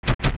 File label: Wallmaster Drop; OOT